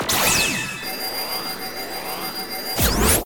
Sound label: Match Decide; Zenonzard